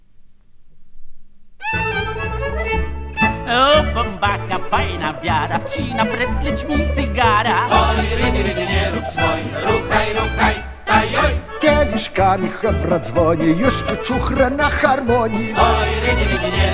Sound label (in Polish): Lwowska Polka